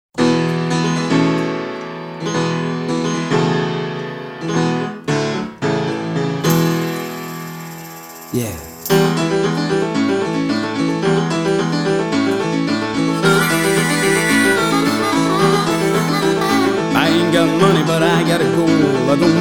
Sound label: Piano sample